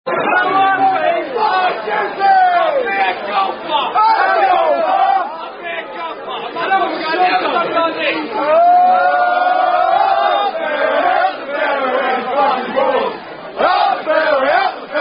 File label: Rugby Song; Unknown 'Golfing' Cante Fable Rugby Song